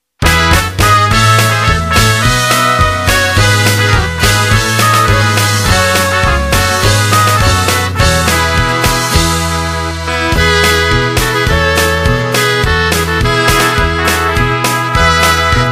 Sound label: INSTR; POLISH WEDDING